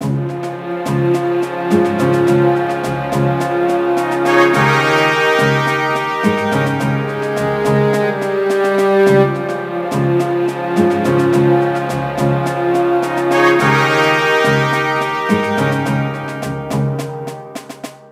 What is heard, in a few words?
Jingle Mission Lose; Age of Empires The Age of Kings; NDS